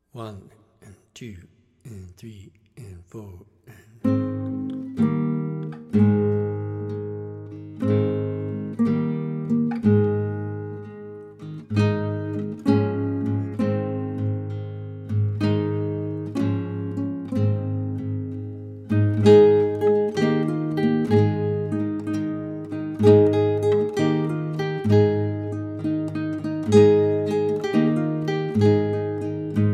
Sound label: in Ab, guitar; Three Blind Mice